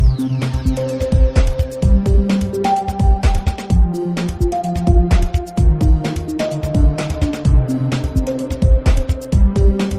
menu theme; geometry dash

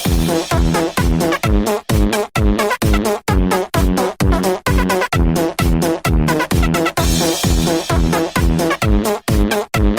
fart beat; itsjerryandharry

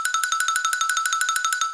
cartoon running on air

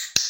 dog click

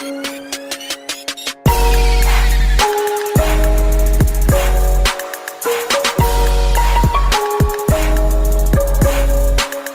anthem remix; minecraft

